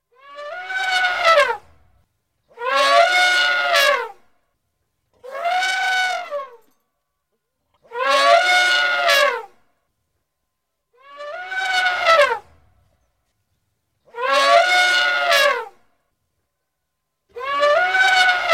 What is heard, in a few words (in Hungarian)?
elefant; allatok; bbc